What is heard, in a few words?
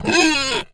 flinch; Rust